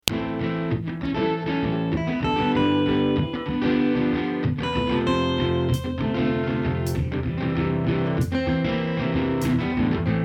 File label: Ambient Guitar Mix